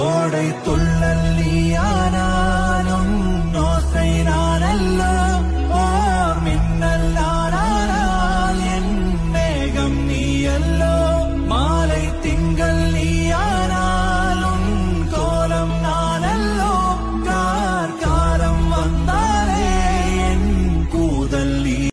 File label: Tamil Melody; Amaran